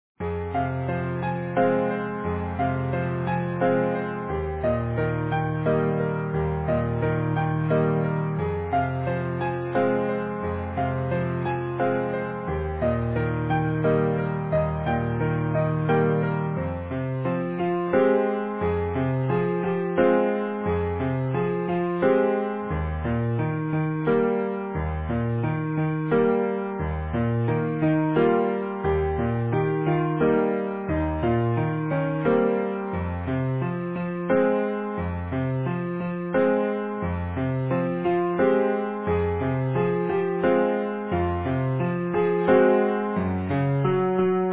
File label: Easy Piano